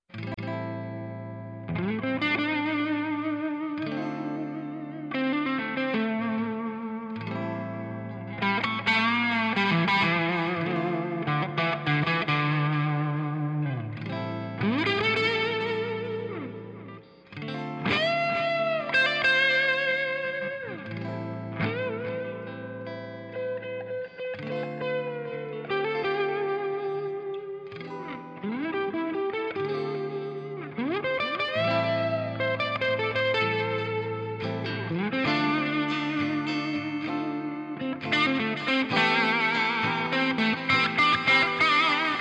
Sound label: Blues Strat Hex